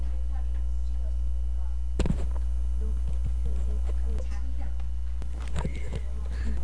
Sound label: knock